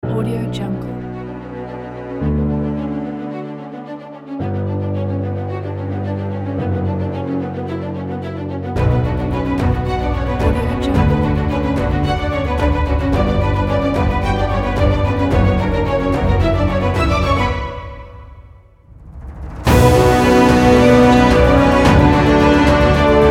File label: epic trailer